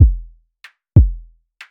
Rhythm maker Randomized 140 Bpm Loop

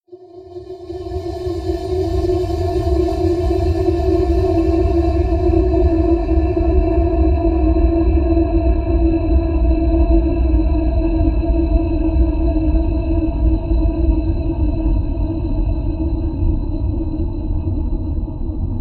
Horror Ambiance

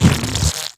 Cartoon Splat

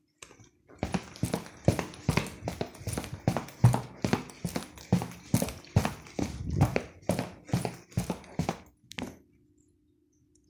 Running Wooden Floor

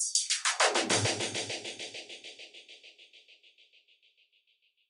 Drum Roll

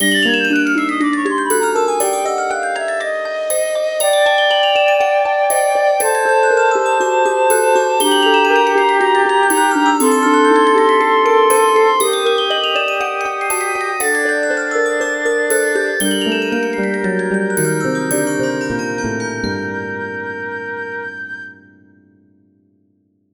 Magic Flute Dream

